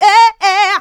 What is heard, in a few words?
Vocals, Adlibs; Houseworx